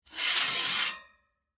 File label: Vent Cover Remove, Industry, Vent, Cover, Removed, Metal, Slide; Machinery Sound Effects